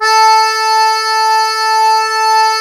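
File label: diatonic accordion A2; USB Soundscan vol.23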